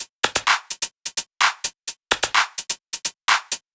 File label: tops 128; Anthemic.Electro; tops loops